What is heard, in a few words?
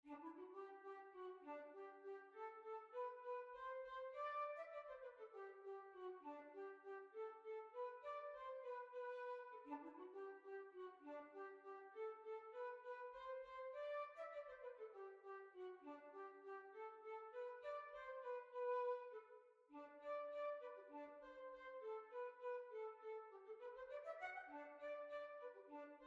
French Quadrille